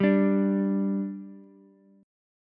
G 5th G Ukulele, chord; G5 strummed

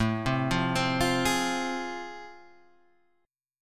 A Minor Major 7th Sharp 5th A, down

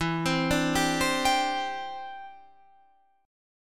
E Minor 7th Sharp 5th E, Guitar Half Step Down

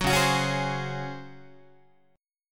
C sharp Major 7th Suspended 4th C sharp Guitar; C#M7sus4 strummed; JGuitar

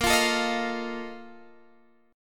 A sharp Major 7th Suspended 4th

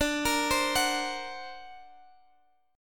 D Augmented 7th down Guitar Open G

